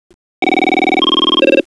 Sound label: ring pattern